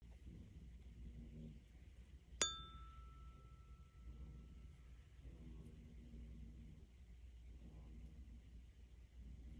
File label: Heart Chakra Tuning Fork; tuning forks chakra color green for heart